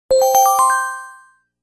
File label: Alert Ascending Chimes, Motorola; Q9h